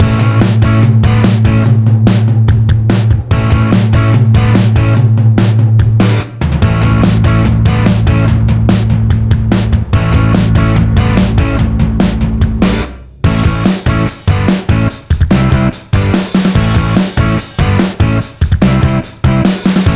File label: Jukebox Indierock